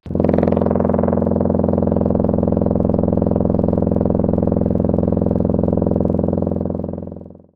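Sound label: brass Trombone, Wavetables; Synthetic's Serum Collection; Standalone-Music SYMPHONY Wavetables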